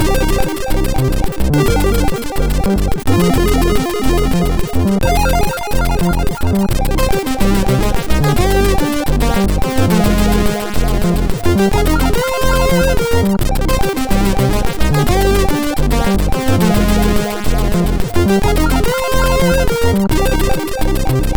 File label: sid MOS6581R2